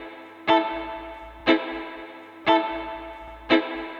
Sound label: Guitar, 70s Analog Funk